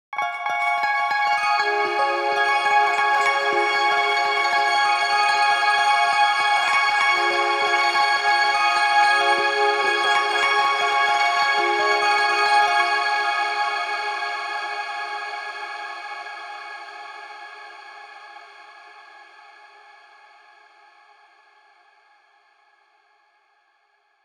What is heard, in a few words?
c major pad thing